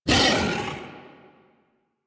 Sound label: Feral Vocal Angry Attack Charge; Warframe; Kubrow